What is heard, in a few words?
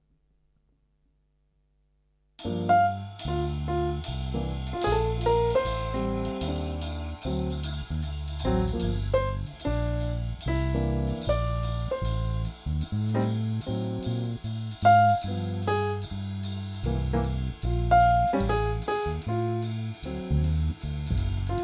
Modern Jazz Piano Licks Solo; 100 Modern Jazz Piano Licks